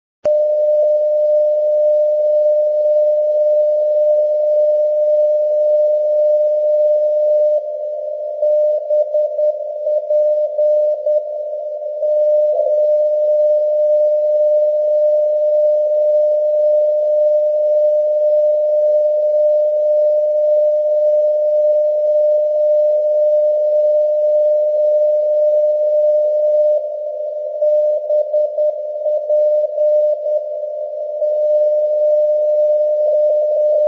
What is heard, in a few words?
ndb bastia; loano; europe